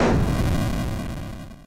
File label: death; roflnauts